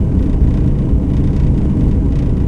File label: vent tube; Star Wars Knights Of The Old Republic II; XBOX